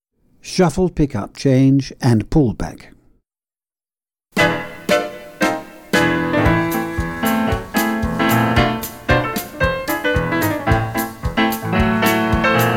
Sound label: Shuffle pick up change and pullback, tap